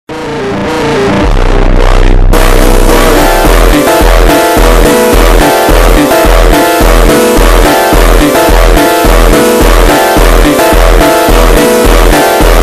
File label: funk slowed